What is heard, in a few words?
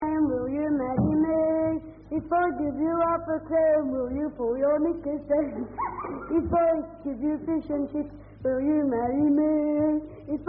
If I Give You A Half Crown, Singers, Children